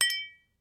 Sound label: cheers; Google; Google Sounds